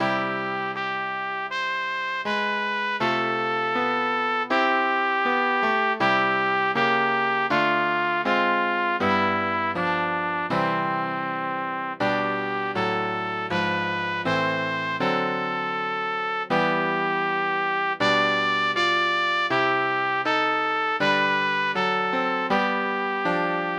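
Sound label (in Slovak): pre trúbku a klavír; Poslaný je anjel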